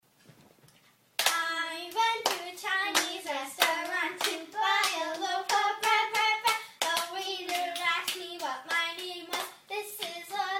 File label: chinese restaurant hand clapping rhyme